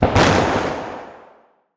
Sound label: Attack Impact; Portal Quest; android; SFX Necromancer Attack Impact